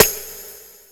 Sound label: RIMSHOT, Ragga & Dub Reggae